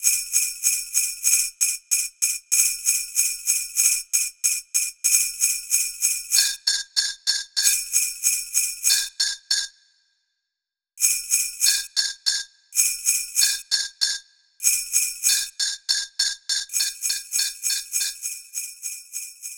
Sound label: Bellsong